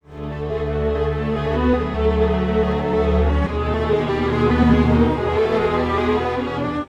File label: strings, timestretch; celemony melodyne